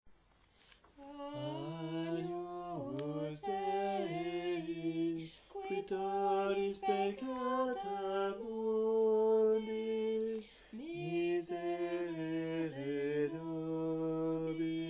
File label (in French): Voix de soprane femme; agnus st jean